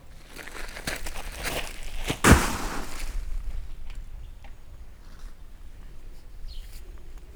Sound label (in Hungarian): nagy ko; varboc